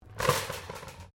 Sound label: Trolley Shopping Supermarket Seat Open, Shopping carts; Stores and Malls